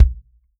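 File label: bass drum, Real Drum Kit; REAL DRUMS; Best Service ProSamples vol.43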